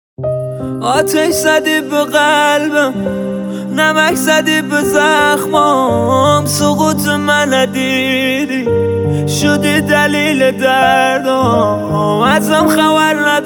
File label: Piano